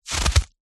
foley footstep single boot wet slushy snow; zapsplat

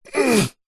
human male short grunt through nose; zapsplat

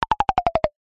cartoon descend knocks wood delicate tip toes

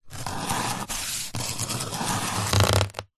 foley cardboard box lid flaps rub scrape together

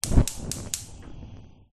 household cooker oven hob spark ignite; zapsplat

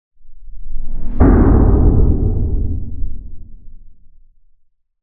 impact underwater or space massive; lukas tvrdon

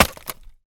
industrial tools axe chop wood; sound effects one

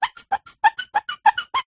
sport snooker cue chalk up squeak; zapsplat

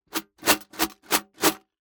Coins Shake Tin

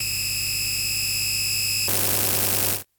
Laser Beam Hits And Disintegrates Object

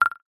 multimedia notification alert clicks fast

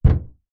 vehicles car bonnet hood hit footstep bang ext

